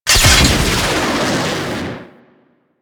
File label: Rocket Pack Launch; Attacks; Warframe; Grineer Ghouls